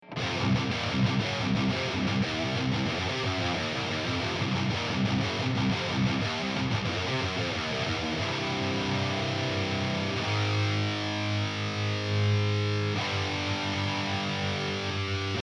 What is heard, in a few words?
amp; huge amp shootout